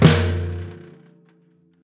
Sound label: high tome drum sample f key; tom drum samples; one shots